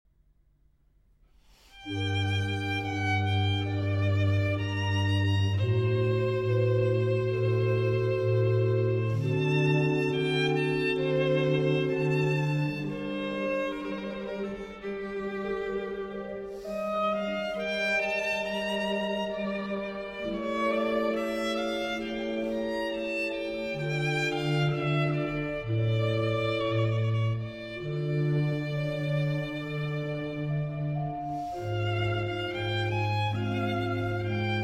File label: Concerto in D minor For Violin, Organ, Strings, and Harpsichord